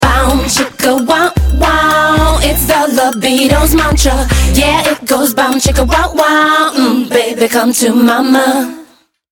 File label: ringtone chorus